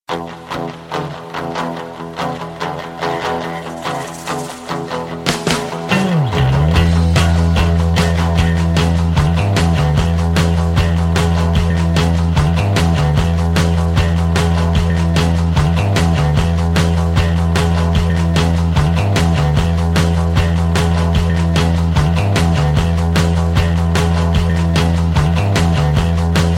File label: Good and Old Rock